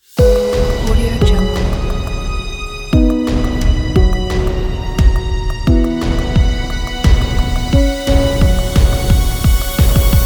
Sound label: the dubstep; foggyfrog